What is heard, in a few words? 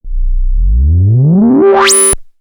Monotron Short